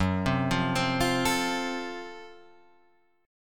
F sharp Minor Major 7th Flat 5th, Guitar, Drop D

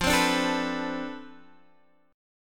D-Minor 13th, F

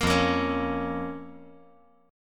F Suspended 4th Sharp 5th F

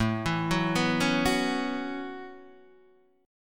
A 6th Add 9th A down Guitar DADGAD

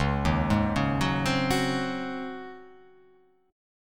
Db Major 7th Suspended 2nd Suspended 4th Db; x,4,1,1,1,1,2,3; DbM7sus2sus4 arpeggiated; JGuitar

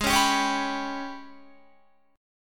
Ab Minor Major 7th Double Flat 5th, down, Guitar; strummed; AbmM7bb5

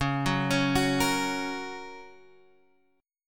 Gb Major Db

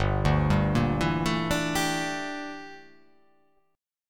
G Minor 6th Add 9th G down

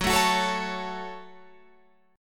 Gb Minor Gb Guitar Open F; strummed; 18,16,18,16,16,16

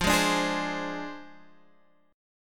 F Minor 6th, Guitar, Standard; Fm6 strummed; 13,11,12,13,13,x; JGuitar

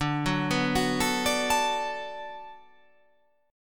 D 6th D down, chord

